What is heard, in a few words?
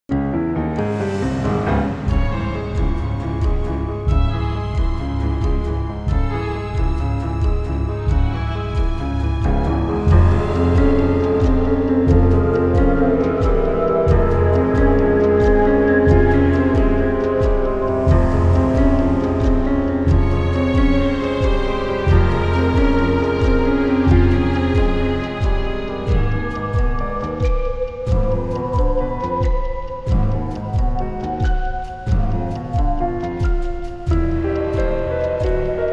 Mansion Stealth; The Da Vinci Code; XBOX